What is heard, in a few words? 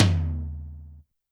Tom Hard, YAMAHA RM1X